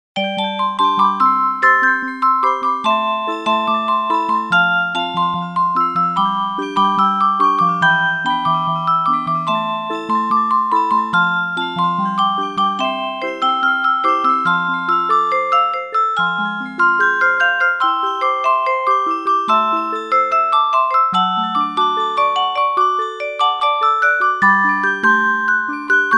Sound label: musicbox; ghibli cat; children